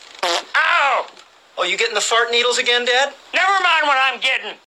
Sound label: Fart